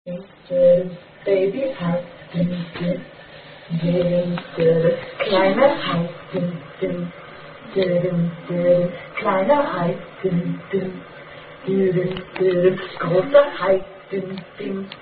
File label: German Attack Song